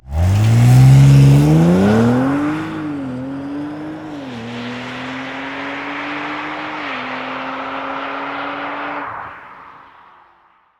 Audi RS4 Beschleunigung außen; rs4 avant; audi sport

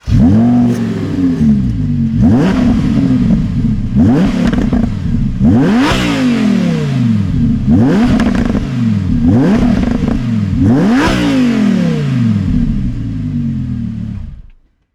Audi R8 Coupe Motor; r8 v10 quattro; audi sport

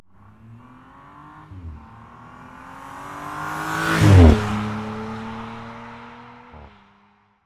Audi RS4 Vorbeifahrt; rs4 avant; audi sport

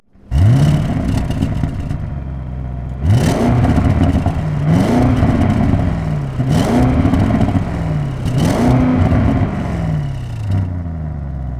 Audi RS7 Motor starten; my2020; rs7 sportback

